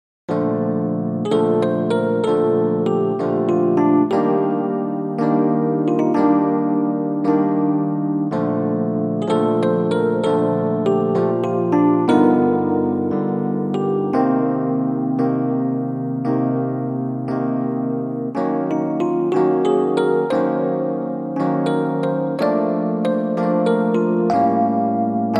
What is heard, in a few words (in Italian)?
jazz lento sib